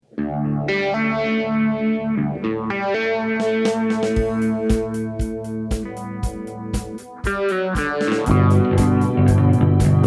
Strings

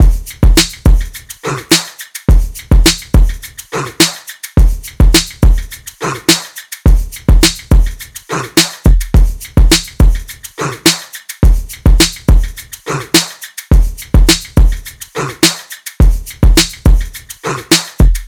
drums 105, Full Drum Loops; drum sample pack; Talang; SEISMIC; Slate

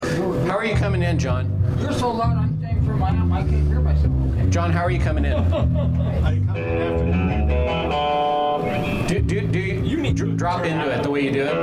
Background Chatter

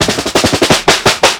snare wrap; Drums; One Shots; Slate; AURORA Sample Pack; Snares

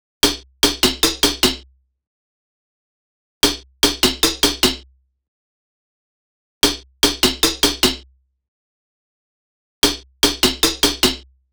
perc loop 75, Perc Loops; Pop; Drums; Slate; SD PASADENA Pop Sample Pack

